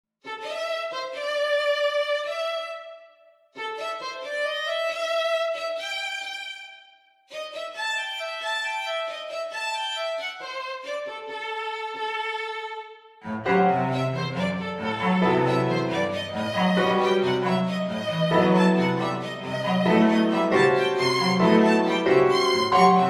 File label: Piano trio